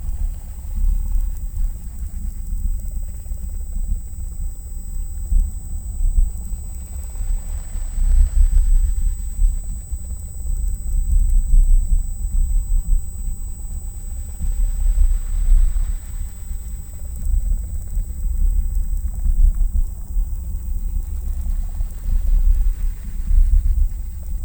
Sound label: Hydrophone